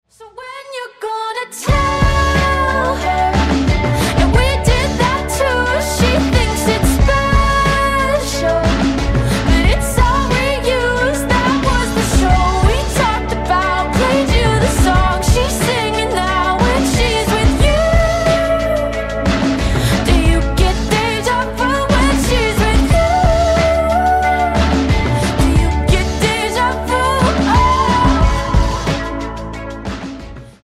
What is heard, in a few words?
ringtone